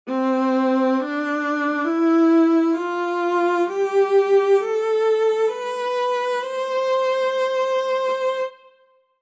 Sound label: Emotional Viola Sustain Room Conservataire Reverb out